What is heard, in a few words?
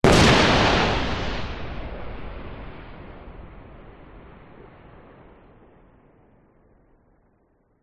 Thunder and Lightning Sounds; ZapSplat